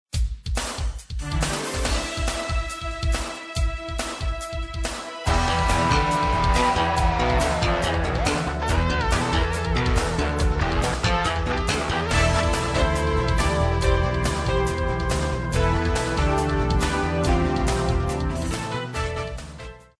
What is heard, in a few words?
rock heavy